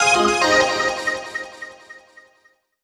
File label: Get Jingle